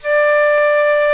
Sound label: FLUTE D5; Greytsounds VOL-1